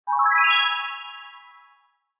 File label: plant Ready; Food Farmer